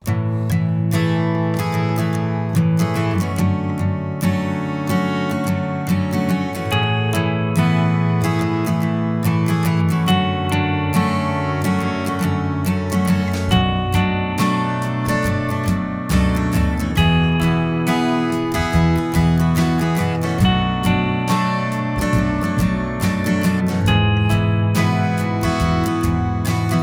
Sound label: Light Breeze